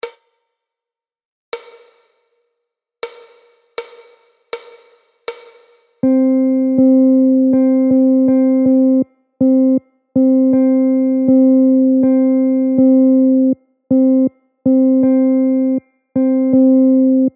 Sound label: 8th rhythm